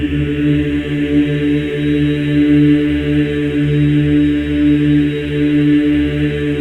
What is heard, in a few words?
D3, Symphony of Voices men; MEN EES OCT